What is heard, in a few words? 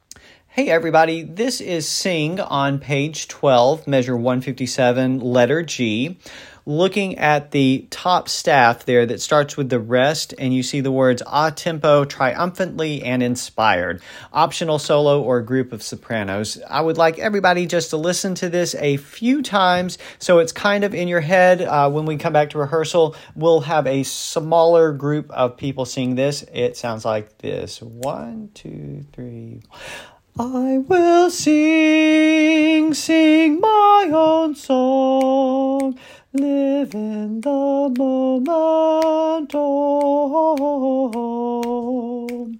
Sing Letter G Descant